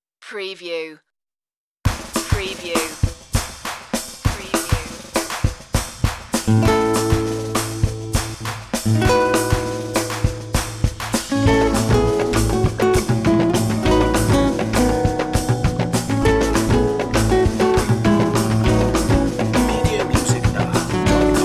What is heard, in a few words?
with guitars